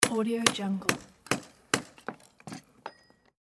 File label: hammering